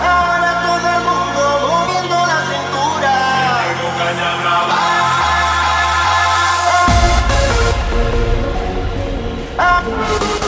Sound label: Dance Tune